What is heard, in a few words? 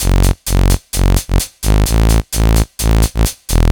No Drums, 129bpm, Nu Dance Electro; Zero-G; Soundclash; Combi Loops; Rude Bwoy